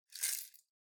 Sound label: Spice Mill Salt Shake